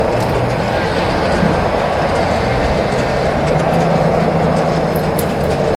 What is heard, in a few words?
Fuel Station Ambience; Slime-san Blackbird's Kraken 2017 pc gamerip